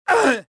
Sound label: choke; rebel